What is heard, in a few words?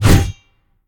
swing; Smite; god Lancelot